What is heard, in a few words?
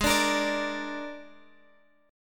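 Ab Suspended 2nd Flat 5th chord, Guitar, Drop D tuning; 18,17,20,19,x,x; down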